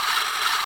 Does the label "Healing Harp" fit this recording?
No